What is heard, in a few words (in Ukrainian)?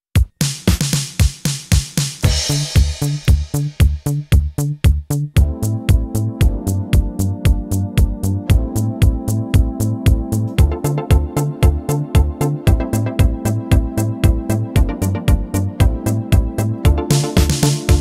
cover наживо ketron event yamaha modx7